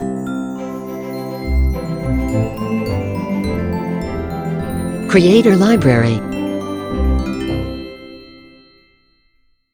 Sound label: Opening Logo; Logo-Idents